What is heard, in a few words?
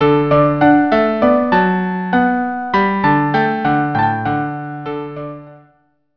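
bouree D violin cello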